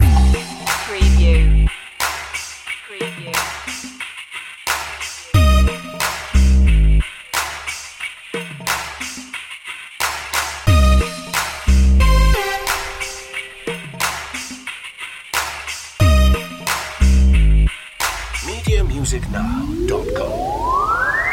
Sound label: trap it loop